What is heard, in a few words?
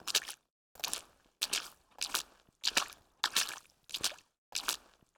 shallows walk